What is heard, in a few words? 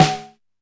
Snare, Alesis DM5 Drum Machines